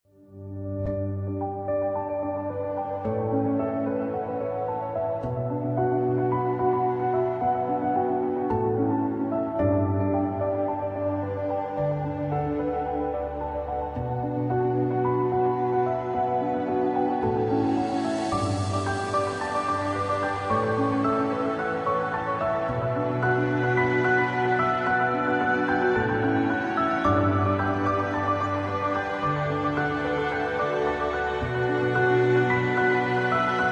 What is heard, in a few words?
Inspiring Cinematic; Makesound